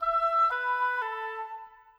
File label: Oboes; Sonic Foundry (Sony Creative Software); Orchestral VOL-2; Modern